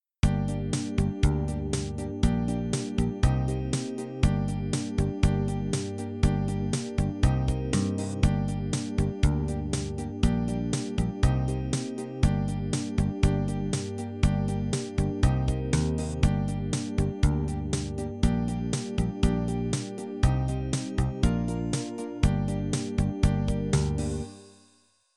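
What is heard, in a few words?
Gm Pentatonic Smooth Beat 120 bpm; no loop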